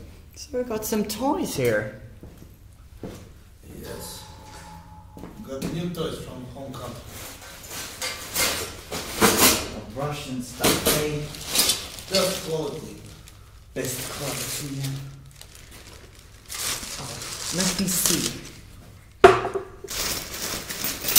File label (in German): Binaurale Demo